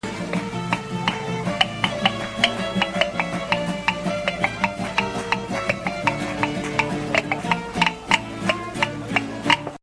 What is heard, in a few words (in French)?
grenouille et manouche Les Vans